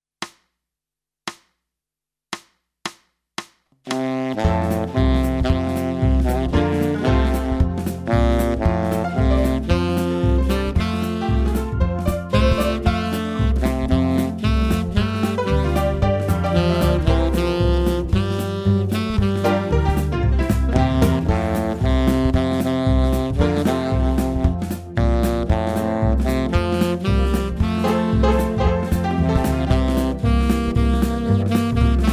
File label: Yellow rose of Texas Tenor